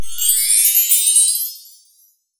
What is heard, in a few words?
Imja Magic